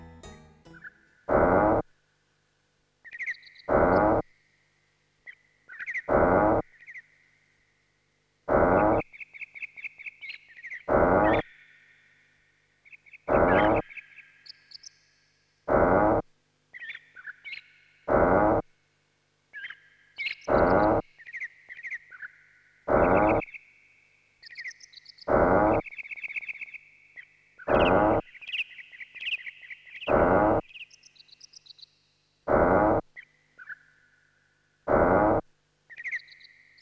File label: Boat Hand Rowing, Bird Voice; Itoi Shigesato no Bass Tsuri No.1; Going to LAKE!